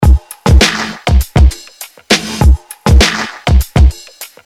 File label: Drumloop; Crowd Chamber; Drum loop warping FX